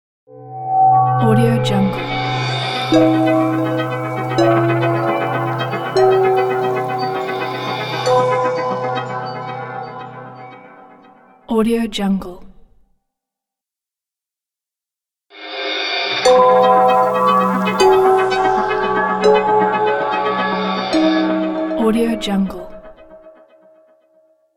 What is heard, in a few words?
positive ambient logo